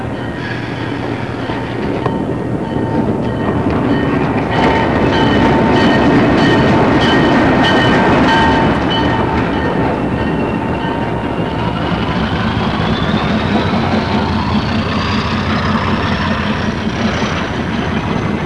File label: RS3 push pull switcher idling freight moving; Mineola; LI City; lirrhorns